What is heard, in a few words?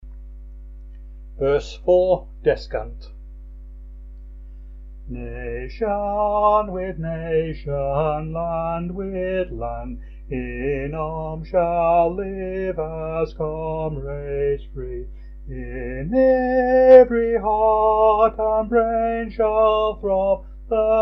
sung verse 4 descant, hymns; These Things Shall Be